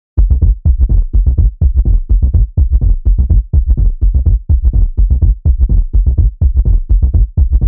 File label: Bassline 125 F; Loops; Muza